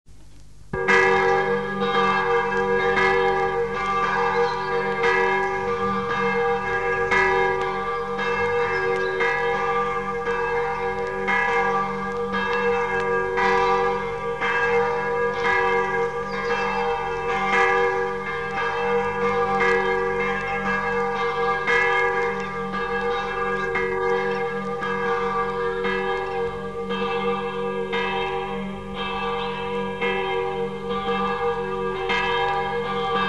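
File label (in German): Altes Geläut; die Glocken; die Kirche; 1963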